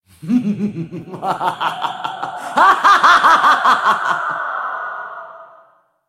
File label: laugh; Black Ice Anivia